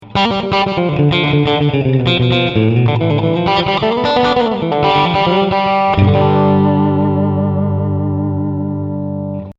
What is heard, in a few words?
fender clean comp slapback